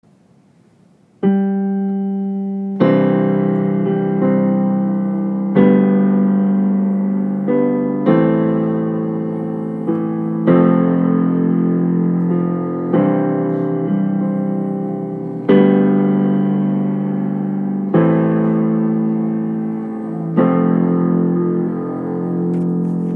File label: rise up solo piano